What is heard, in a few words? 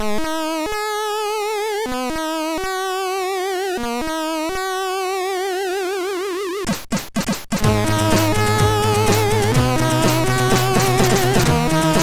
Alien Sound, MOS6581R2; Ozone